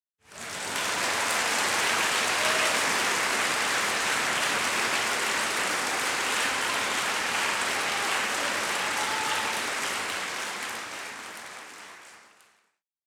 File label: Applause - Large Crowd - Shorter Interior Music Hall Orchestra - Shorter With Som; With Some Bravo; Hollywood Edge; sound designer's toolkit v2